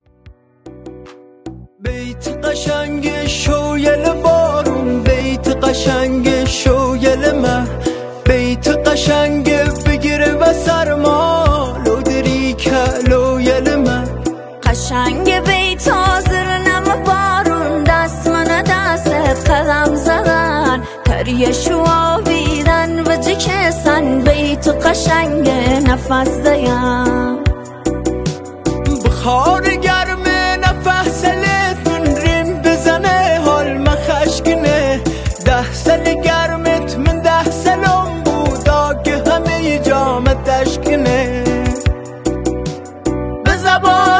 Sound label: lori ghamgin khoram abadi